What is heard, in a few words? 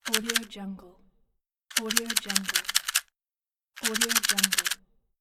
matchbox; fx market